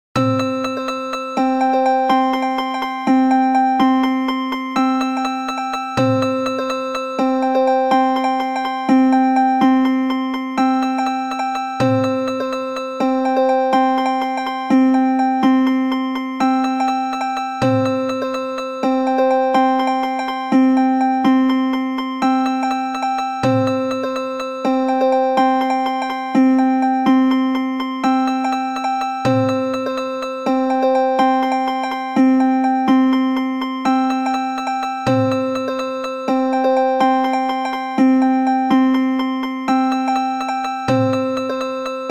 harmonics for example long bar with polyrh and normal rhythms; tunesmithy